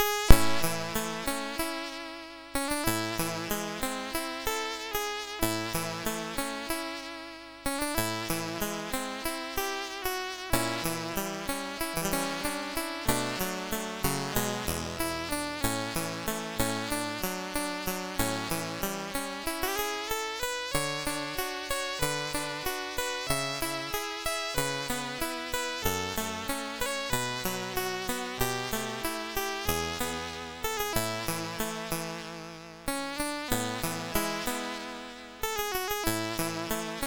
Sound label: Lullaby MOS6581R3; sid